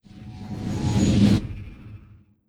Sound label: Armor Strike Magic